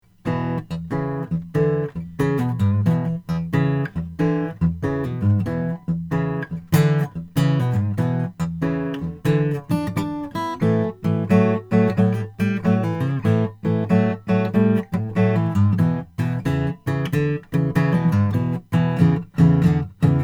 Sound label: froggy bottom h12 all koa deluxe